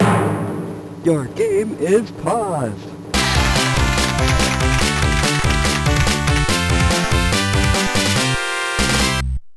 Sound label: your game is paused; MED; Megaball